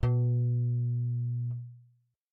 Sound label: b1 bass; matching game